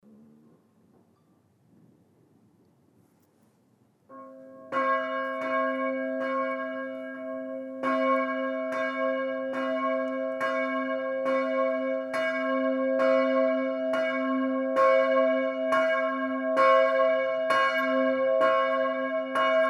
solos, cloches; Dommartin

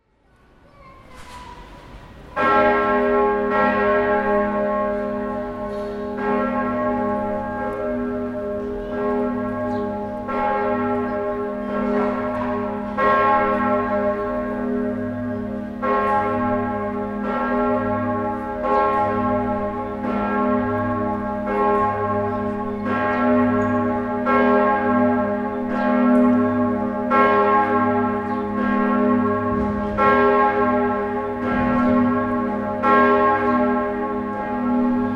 Berne cathédrale cloche; AUDIO ZOOM

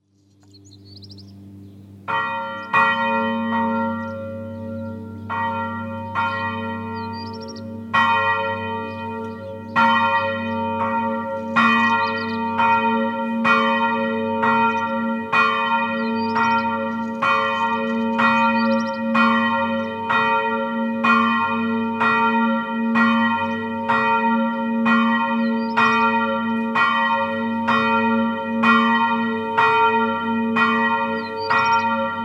Villarimboud cloche

